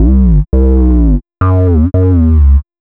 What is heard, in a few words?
DnB Bass 170 C, drumnbass 170bpm; musicradar; Basslines B